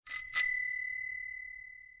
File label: Bell Bike